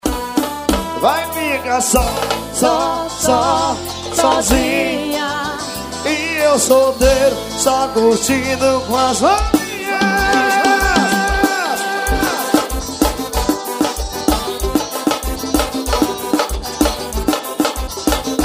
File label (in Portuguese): forró